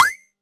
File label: trap trigger; Environment